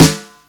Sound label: nice snare sound b key, snare drum tuned to the B note. Loudest frequency: 2074Hz